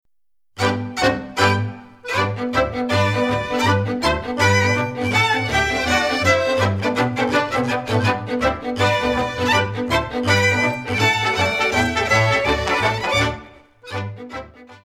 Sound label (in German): Zanglgalopp